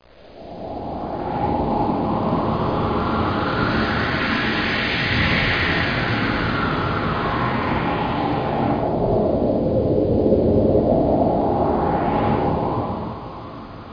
mars wind; PLANET X

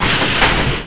ELEV

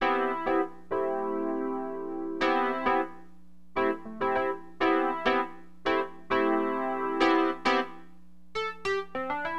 RHODES, AcidJazz Funk Keyboards; LiveAct Pool 1

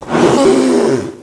bulf Hurt; NETSTORM

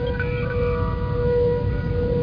hail

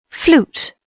FLUT; Dorling Kindersley My First Incredible Amazing Dictionary

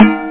MELT; ACH ClubDiskette No.15

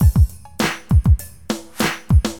DM LOOP, Drums, AcidJazz Funk; LiveAct Pool 1

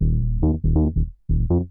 bass E1; Techno; TechnoMusicMaker

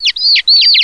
hit stars

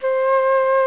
Recorder; Sound Library 2000; Wayzata Technology

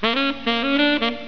Riff; The Cruncher 1.1 Demo; Cruncher Candy

